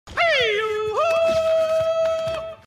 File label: Eagle Cry